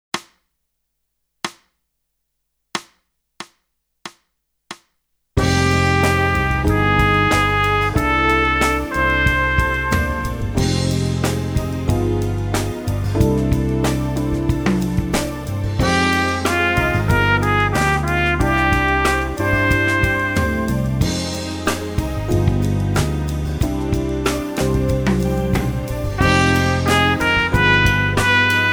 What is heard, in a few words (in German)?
Echo Ubung